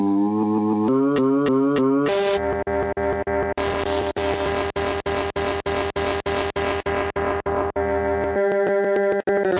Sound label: slide glitch; Ze Franks Pain Pack